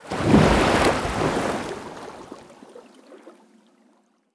Personnel Crew On Chute Landing Water; DCS

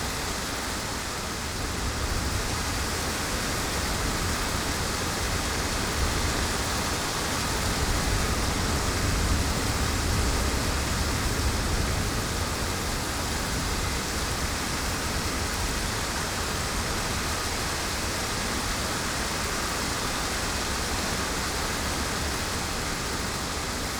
ambience desert forest night windy rainy; Rust

